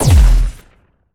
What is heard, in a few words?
Sci-Fi Weapons-Assault Rifle-Single Shot